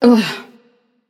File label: ugh passion; chaac